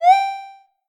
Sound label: pop open; rhythmbullet